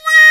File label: Trpts; Club-50 - Foundations Roland; Trumpets; Wa Trpts